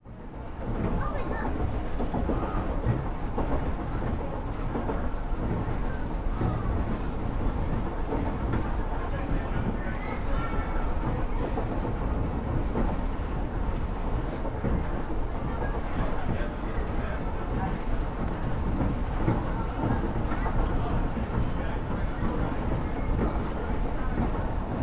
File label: Street Car - Vintage - Car - Interior - Crowded - Ride - Passengers; Soundiron; Subways & Streetcars; Street Car (Key Line)